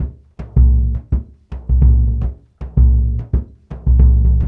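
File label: 108 bpm, ethno drums, low drums; Spectrasonics - Supreme Beats - World Dance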